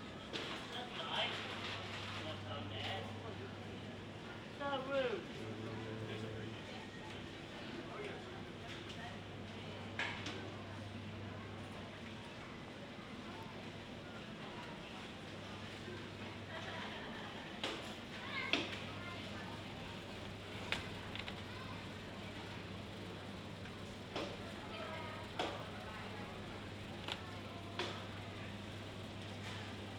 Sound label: supermarket; IEEE AASP; scenes